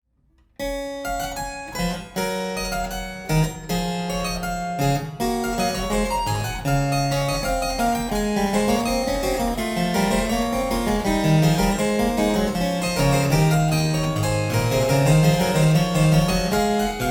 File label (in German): Dreistimmige Sinfonie D dur